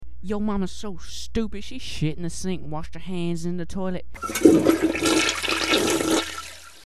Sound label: flush joke; mamma